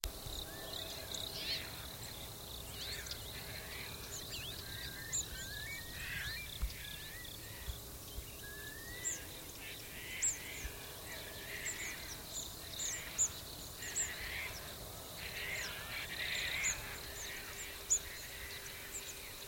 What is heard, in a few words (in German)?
Waldpieper